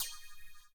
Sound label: CYM, Ethno Techno; ILIO - Ethno Techno